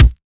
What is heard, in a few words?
beat